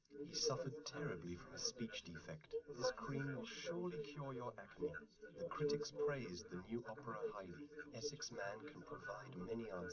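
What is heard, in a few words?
male, live recording 130ms 1m; SiSEC11; underdetermined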